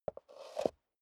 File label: step high heels concrete scuff; schritte; Footsteps; menschen